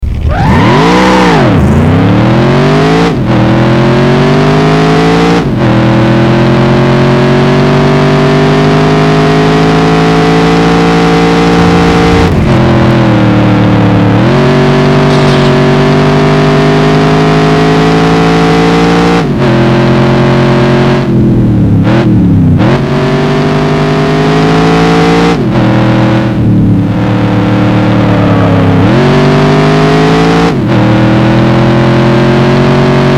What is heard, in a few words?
driving speed